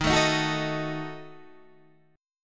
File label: D sharp Diminished D sharp, Guitar, Open D; 13,12,13,12,12,13; chordshape